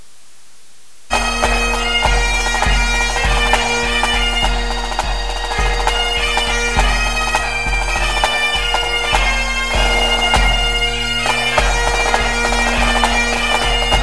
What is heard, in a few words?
bagpipe